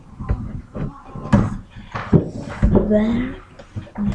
bell

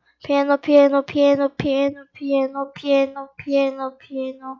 piano

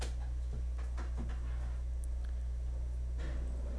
sweep

